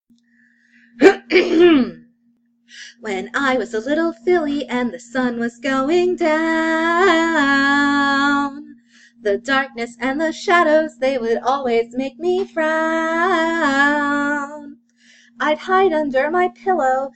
Song

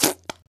Spit; Lewd SFX

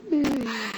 Moan

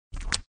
Suck; Lewd SFX

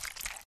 slosh; Lewd SFX

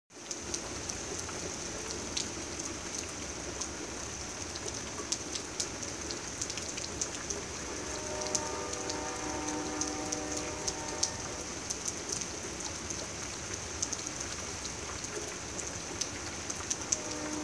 Rain and Train

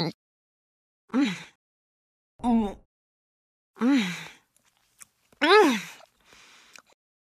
Grunt; Lewd SFX

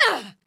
Grunt; Lewd SFX

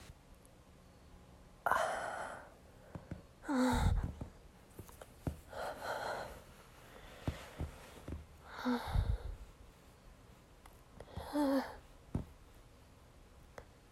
moanz

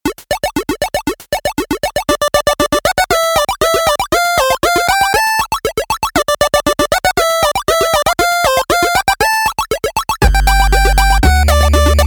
8-Bit

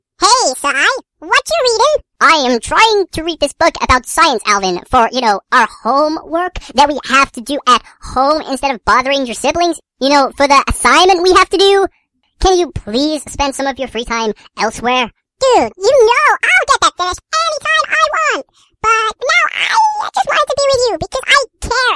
kiss

